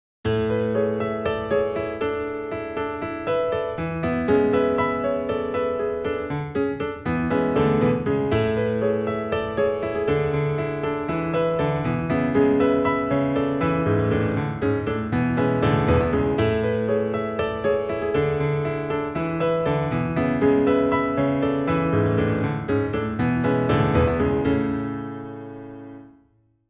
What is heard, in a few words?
steinway